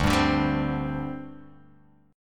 D 7th Suspended 4th, down

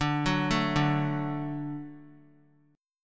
D Major D down, D Chord; D arpeggiated; JGuitar

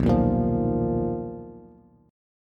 B Major Sharp 11th, Bass, Bass Standard; BM#11; strummed; JGuitar

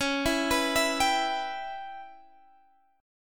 C sharp Minor 7th Flat 5th C sharp

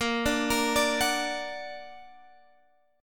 Bb Augmented, Guitar, Standard; arpeggiated; 18,17,20,19,19,x; JGuitar

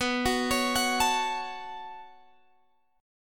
B 7th Suspended 2nd, Guitar, Open G

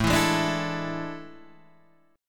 A Major 7th Suspended 4th, Guitar, Half Step Down

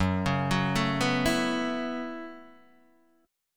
Gb Minor 11th Gb Guitar DADGAD

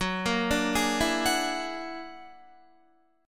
Gb Augmented 9th Gb down Guitar 4F,4A,5C,5G,5C,6E; Listen to Gb+9 arpeggiated

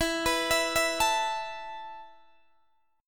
E Suspended 4th E down Guitar Open D

